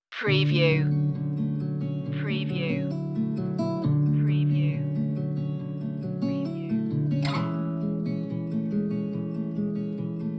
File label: guitar only